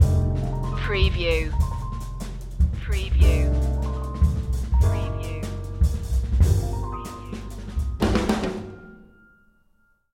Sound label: jazz fusion stinger